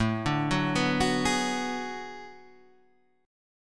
B Minor 6th A; Bm6/A arpeggiated; JGuitar

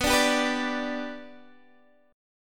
B Minor Sharp 5th, Guitar, Open D

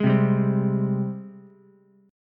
G sharp Major 7th G sharp, Ukulele; JGuitar

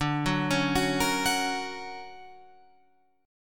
D Augmented Major 7th down Guitar Open F; D+M7 arpeggiated; JGuitar

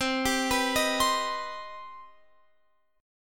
C Minor Major 7th C Guitar Open F; CmM7; arpeggiated

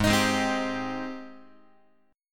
G sharp Major 7th G sharp, Guitar; JGuitar

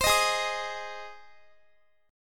Ab Major Ab down Guitar; Ab strummed

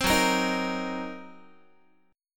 Gb 6th Flat 5th Gb down Guitar

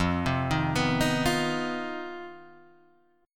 C Minor Major 7th Double Flat 5th F, chord

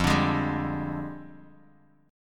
F Minor 6th Add 9th F down Bass Standard; JGuitar

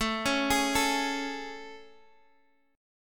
A Major 7th, Guitar Drop D; chord

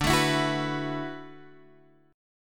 D 6th Add 9th, Guitar, Open G

